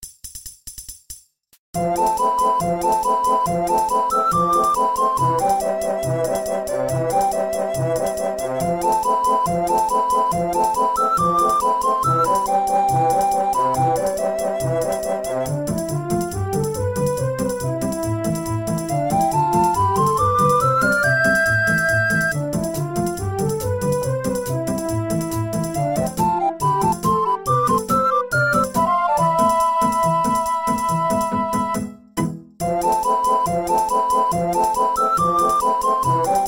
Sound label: virtual ocarina; the legend of zelda ocarina of time; syntheway strings